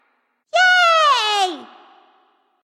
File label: yay; scylla